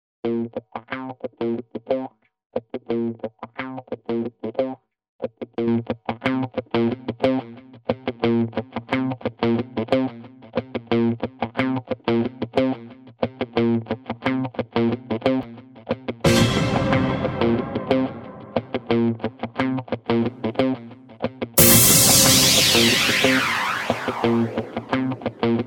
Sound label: HIP HOP